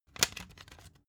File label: cd take out